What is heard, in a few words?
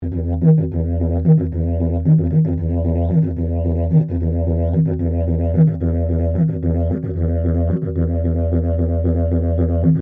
Didgeridoo Traditional Tapered Yonglu Drone, Traditional Australian Aborigine; Traditional Australian Aborigine didgeridoo designs; Loop; Musical